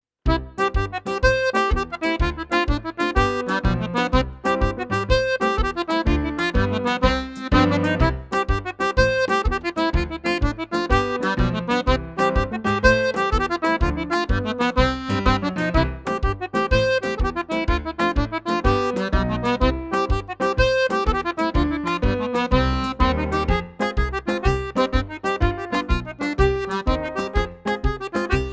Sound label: Single Jig